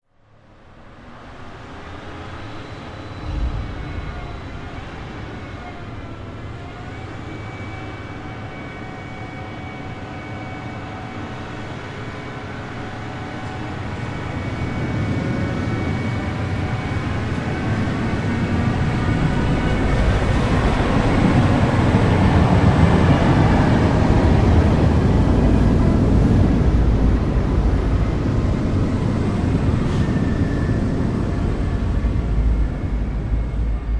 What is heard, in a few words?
Train Starts And Pulls Away, Monstrous, Type 2; futuristic